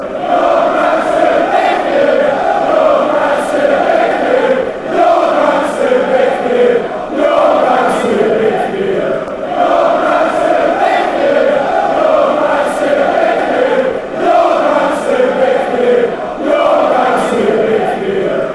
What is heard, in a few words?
ground to big for you, fanchants